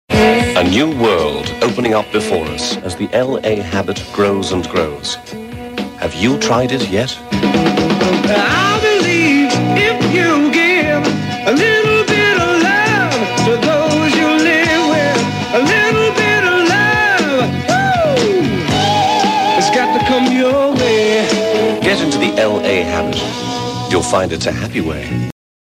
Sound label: jingle; Caroline